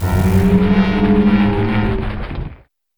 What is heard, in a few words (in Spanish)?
Grito; Orthworm